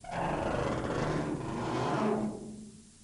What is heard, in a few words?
Axe Stress Soft, creaks